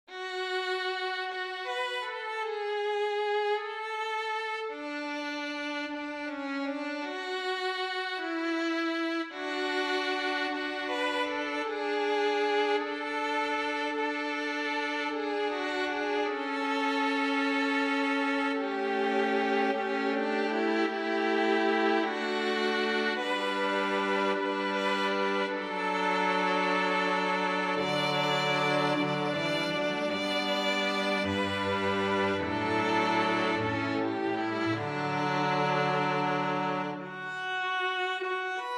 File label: More Holiness Give Me INSTRUMENTAL